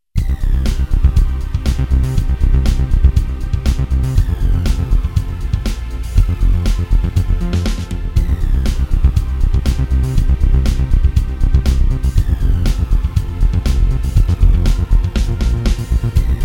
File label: Space Synth Extreme; Made with Cubase, Vanguard and Nexus VSTI